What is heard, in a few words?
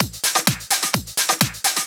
Clubhouse Loop 128 BPM